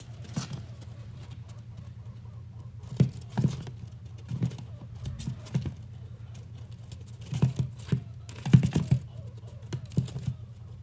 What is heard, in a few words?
Animal Sounds Wildlife